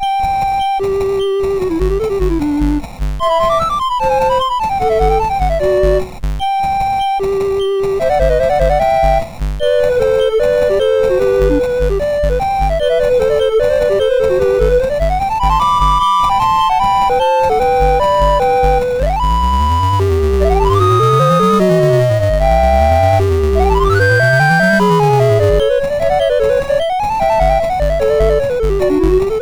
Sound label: Mozart Duet, MOS6581; Syl